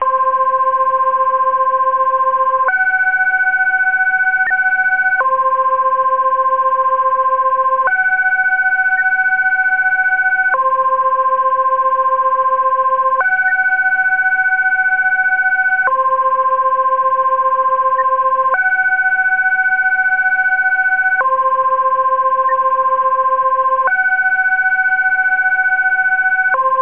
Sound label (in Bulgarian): Национален сигнал за тревога; национални сигнали за тревоги